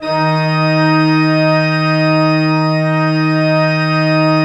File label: PLENO D3, Cathedral Organ; ILIO; Propeller Islands